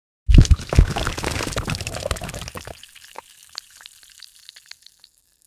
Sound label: tar pit bubbling thick